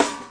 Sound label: stereo snare hat; single hits